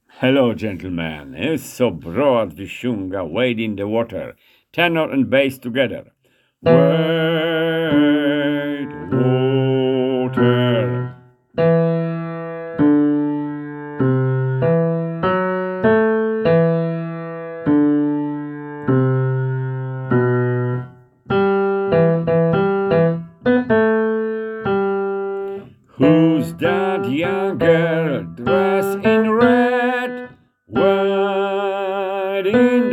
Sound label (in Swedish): bas o tenor